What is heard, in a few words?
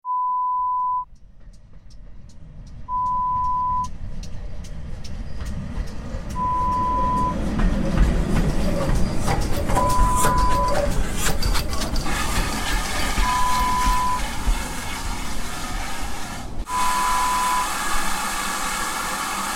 Steam train sound